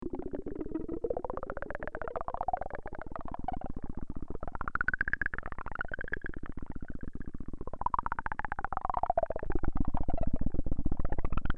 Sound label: impro noise toaster